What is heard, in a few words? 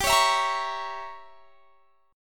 G Diminished 7th; Gdim7 strummed